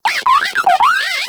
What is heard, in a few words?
Moa Compassionate Vocal Reward Praise; Warframe